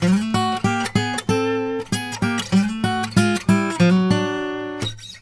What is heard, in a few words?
Blues Guitar, LICK; ILIO; Fretworks